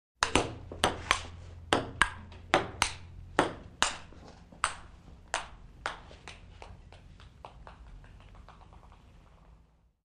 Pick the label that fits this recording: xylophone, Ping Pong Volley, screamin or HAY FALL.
Ping Pong Volley